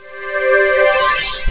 success

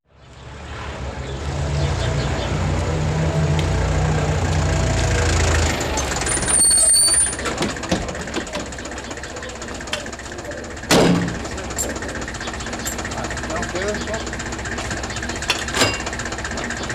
TAXI LONDON TAXI DRAWING UP

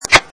KISS; romanticos

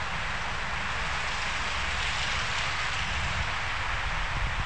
RAIN

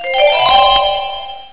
success

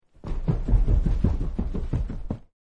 stairs

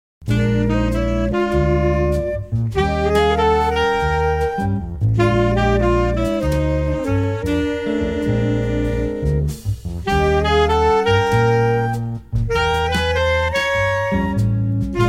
instrumental

